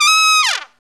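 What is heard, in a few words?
Trumpet Fall, Roland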